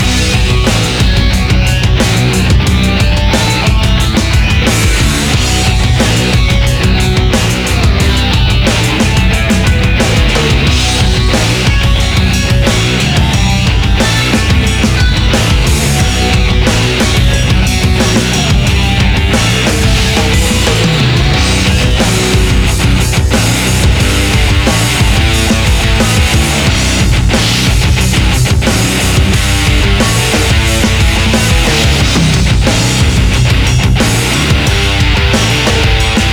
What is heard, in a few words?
bgm result loop; crows burning edge; ps4